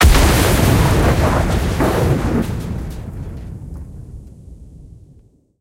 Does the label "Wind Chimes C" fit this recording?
No